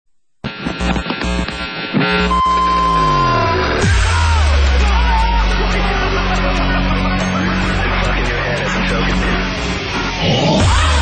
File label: Dubstep show intro